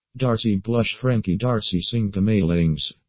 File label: mmmm